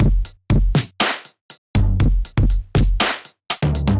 Kit, Drum Kits Standard; Chicago Kit; Ableton; Factory Packs; Drum Essentials

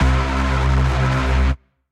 Bass; Slant Bass

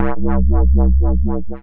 Square Wobble Bass; Ableton; Factory Packs; Synth Essentials; Analog

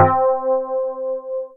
Reedy Piano; Instruments; Synth Keys; Ableton; Synth Essentials; Operator

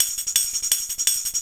D&B Tambourine loop; Dance DJ; Percussion

